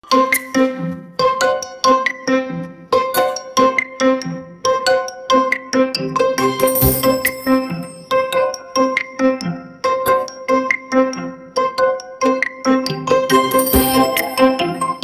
comedic music

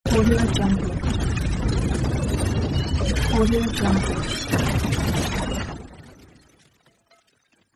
shocking attack sound effect

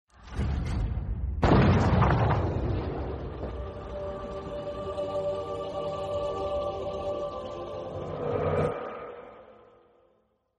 gun shot; jhin

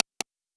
clear mouse clicks

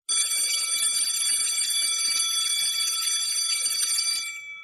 bell ringing

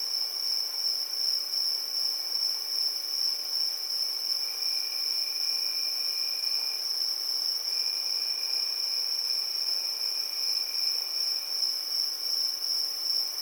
summer crickets loop

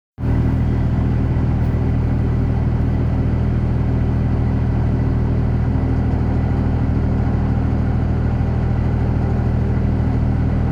loud machinery

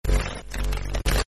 error glitch

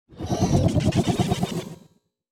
high tech robot movement

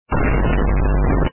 vine boom low quality sound effect

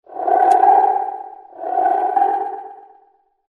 giant frog sound

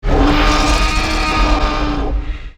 roar attack; cloverfield

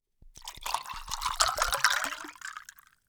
liquid poured in a jar with ice

